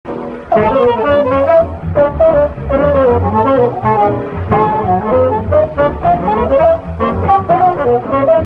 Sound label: melody